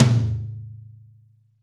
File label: Real Tom alt, Roland BK-5